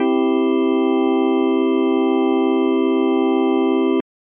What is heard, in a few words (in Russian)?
organ akkorda do mazhor c 4